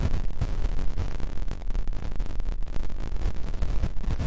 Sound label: 112 pure distortion; LOOPING AMBIENCE